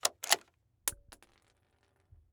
Weapon Bullet Shell 270 Rifle Eject Shells; AdobeCC

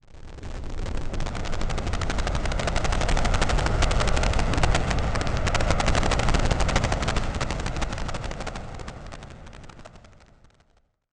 Title Transition Chopper; Adobe; Production Element

